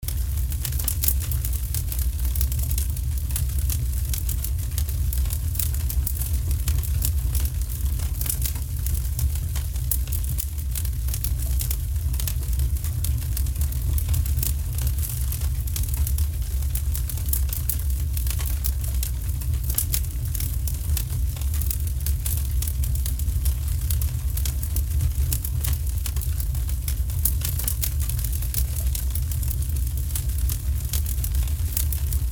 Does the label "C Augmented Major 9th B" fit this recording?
No